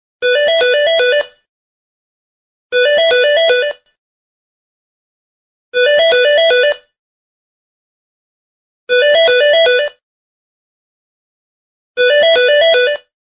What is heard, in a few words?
office tone ringtone